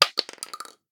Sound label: Shell 12ga wood; STALKER; IWP